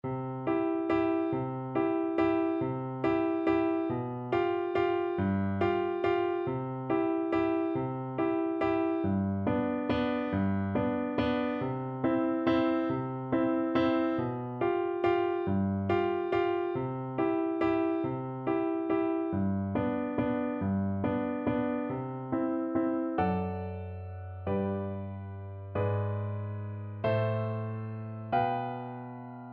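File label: la donna, xylophone, Percussion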